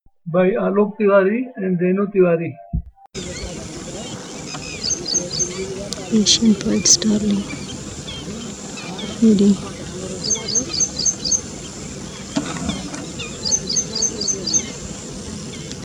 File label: Ashy prinia; Alok